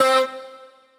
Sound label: Whoa C; Noises; Synthetic's Serum Collection